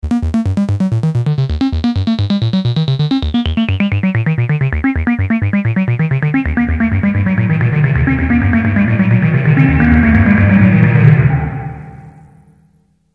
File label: vco